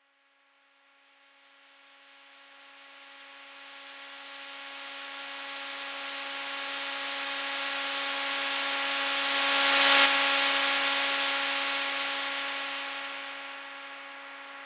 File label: Harmony Noise